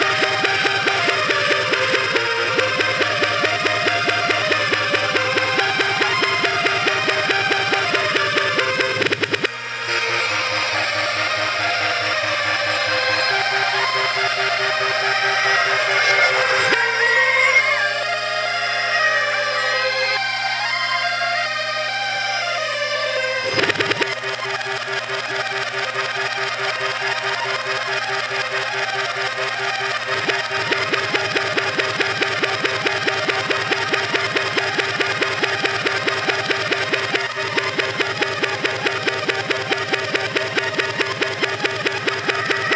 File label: techno